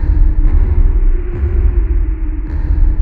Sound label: CYBER KIT, DRUMS